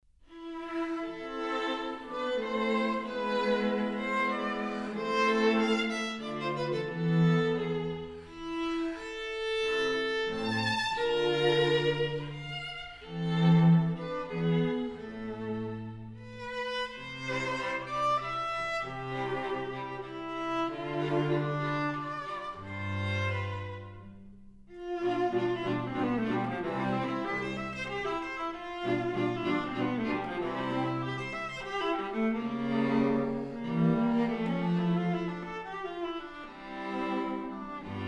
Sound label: trio